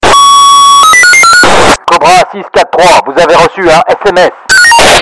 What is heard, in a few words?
sms police france